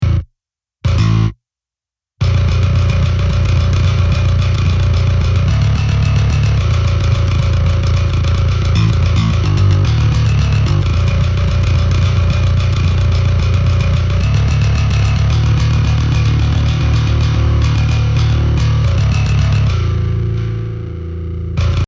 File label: Bass